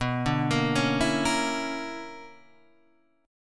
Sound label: B-Minor Major 11th; {7 5 8 6 5 6} arpeggiated